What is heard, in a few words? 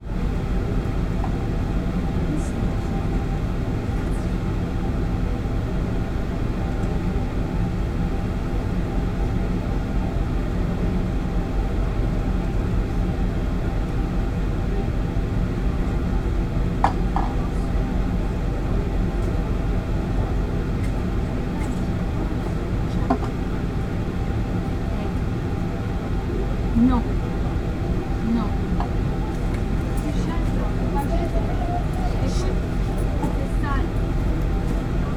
transport boat ship ferry onboard lounge engine drone people chat close by french